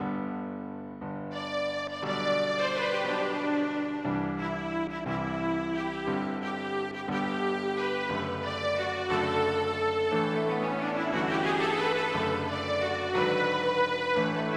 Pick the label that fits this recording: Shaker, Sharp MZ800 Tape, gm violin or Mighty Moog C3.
gm violin